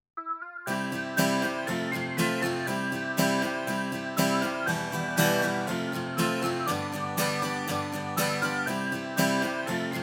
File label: Karaoke